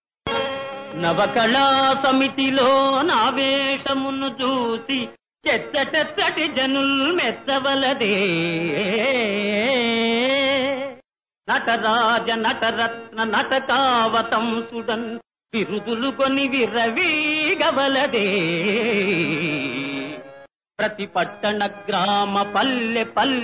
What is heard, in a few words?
Padyam; Appu Chesi Pappu Kudu